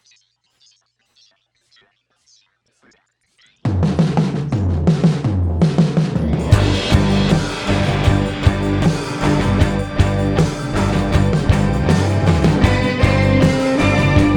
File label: Grungey Pop Rocker